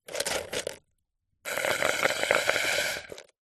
drink slurp soda dregs ice through straw fast food; human; zapsplat